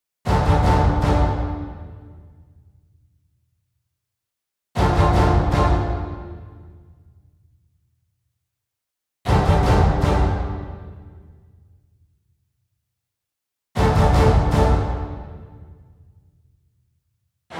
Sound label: Epic Anthem